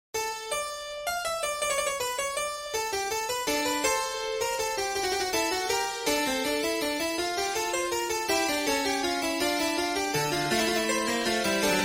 Gigue, Harpsichord; du 1er Ton; Pieces de clavecin; Lambert Chaumont; RetroMagix Harpsichord

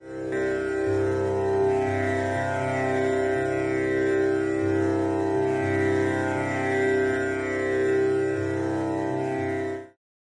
Tanpura Drone F Binaural; Sitargen; syntheway

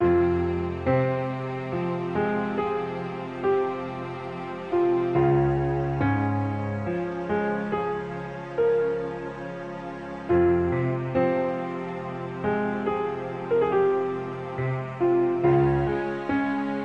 Realistic Virtual Piano Steinway, Flute, Strings; DAL Flute; Syntheway

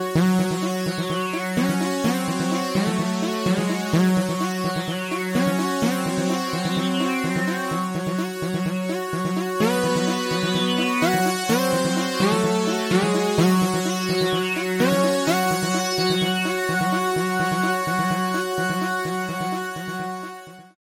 LEAD Resonant Glide; Synthelium; syntheway

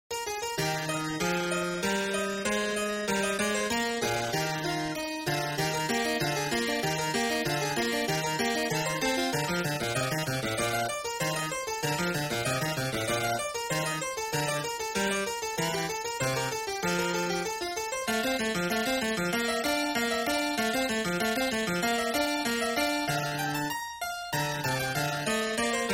Fantasia for Harpsichord E Flat Major; Georg Philipp Telemann; RetroMagix Harpsichord

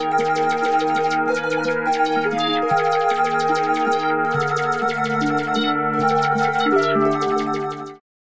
Birdsong; Waverator Spectra; Fantasy; Syntheway

